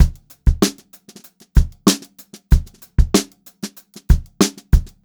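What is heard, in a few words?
STRAIGHT VARIATION, Funk-Groove Drumloops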